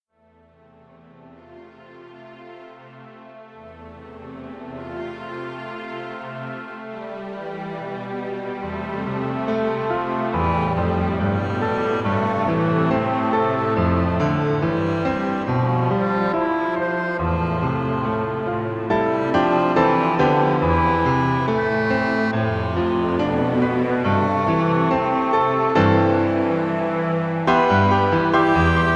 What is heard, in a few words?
Orch Finale